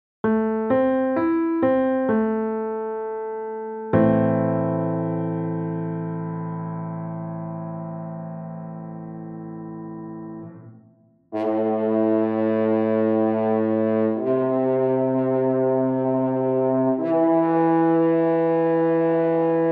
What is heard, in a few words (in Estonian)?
Metsasarv; a-moll